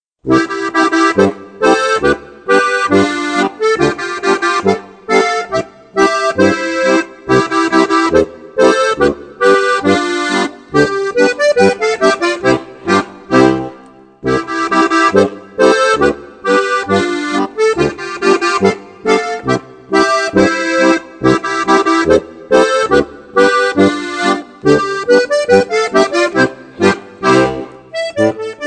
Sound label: polka